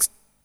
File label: KIT, Dance Mega Drums; Best Service